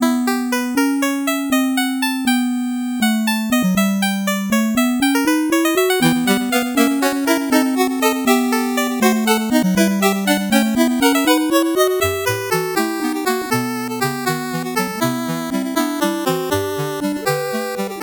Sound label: 8bit bgm fast